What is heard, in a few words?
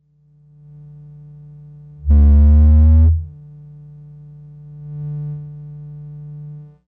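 bass overdrive; Parasite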